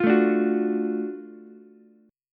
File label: Gb Minor Major 7th Sharp 5th, Ukulele, chord; strummed; JGuitar